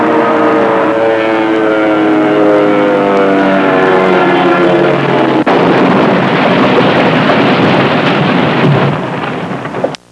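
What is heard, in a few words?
PLANE CRASH; trasporti; aerei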